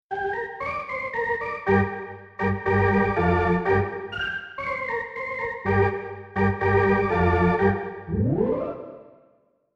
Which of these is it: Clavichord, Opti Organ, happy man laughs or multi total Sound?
Opti Organ